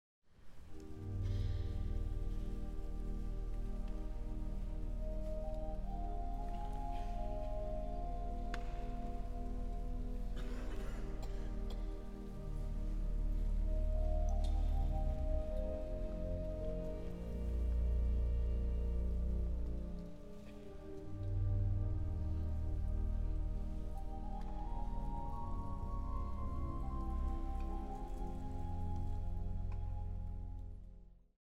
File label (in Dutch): orgelkoraal psalm 123 aansluitend samenzang; vers 1; Psalmzang vanuit Rotterdam